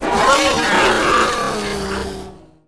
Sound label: acklay attack light